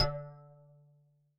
Tech Drum Hit, techno 120bpm; musicradar; Drum Hits

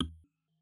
Perc, techno drum

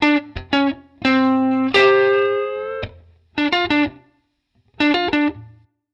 Guitar Line, hiphop; 85bpm; musicradar

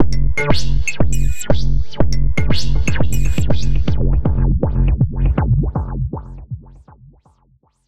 Tech Beat 120 fx, techno 120bpm; musicradar; Beats

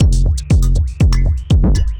Tech Beat 120, techno 120bpm; musicradar; Beats

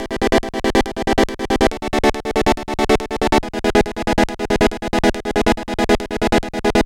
140 Chord E, 140 BPM; loops; CHIPSHOP DELUXE

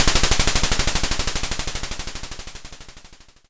YM Perc, DRUMS; ChipShop Deluxe; ChipShop Hits

